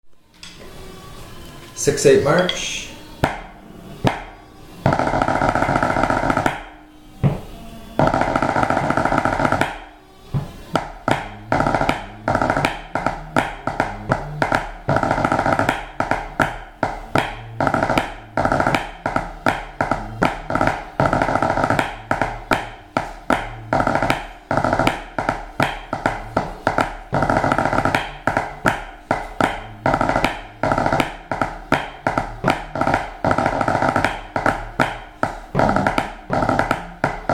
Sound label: Massed bands Drum Beating 2 4; drummers music library